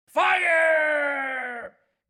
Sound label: fire